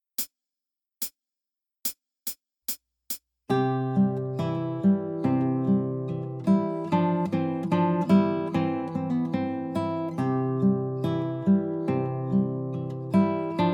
anglaise; molino

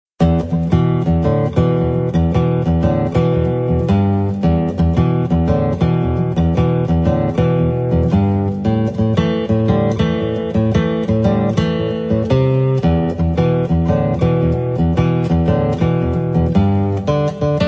rock

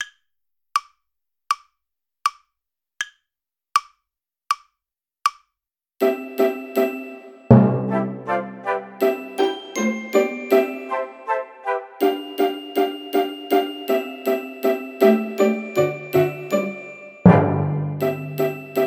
playalong slow; jingle bells

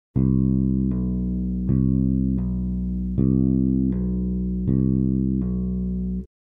hammer off

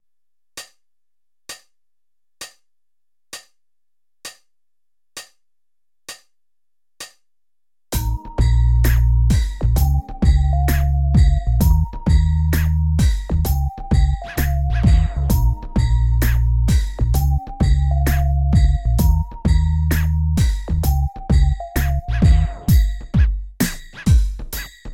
hip hop shuffled

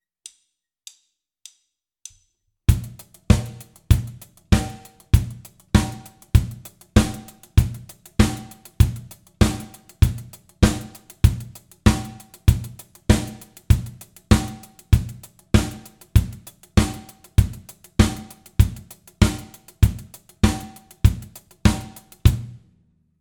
discofeel

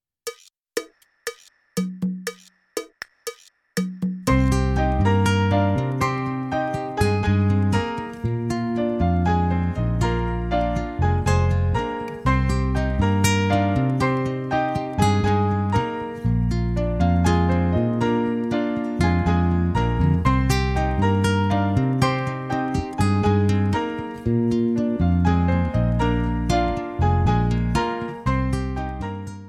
cuban montuno

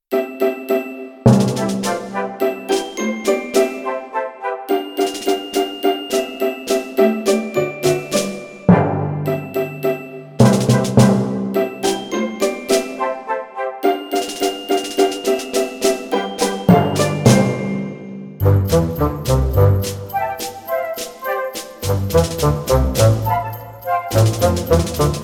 jingle bells difficult version

